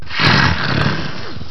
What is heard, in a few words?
zombie idle; blackhawk